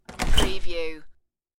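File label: Front Door Open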